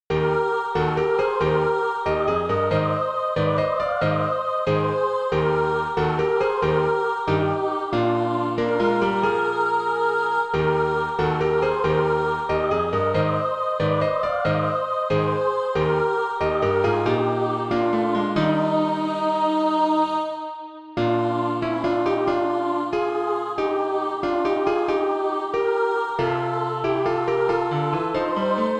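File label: mixed, SATB Practise Music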